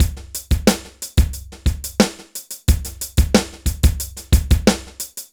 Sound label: BPM 90 DRY